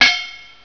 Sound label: clanger; ambience